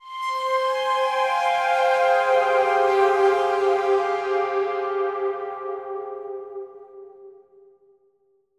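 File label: Synth F minor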